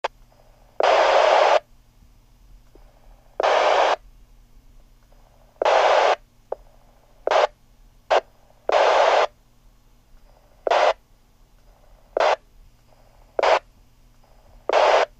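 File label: Static Mobile VHF Radio, static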